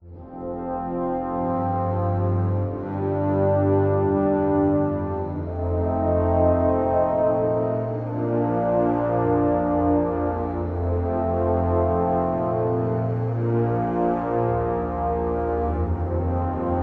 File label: Proud Brass Chorale; Hellsplit Arena